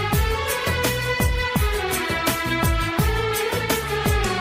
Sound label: Old Is Gold Music Ringtone